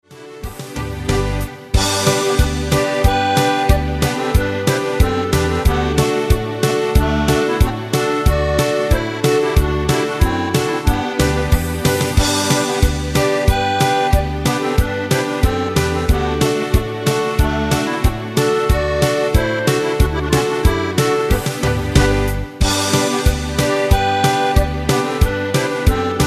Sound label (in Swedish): lyckozidens polka